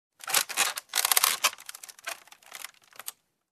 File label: Crackling Creak